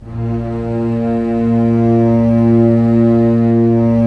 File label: STR SEC, Roland LA Composer, Sec Mute St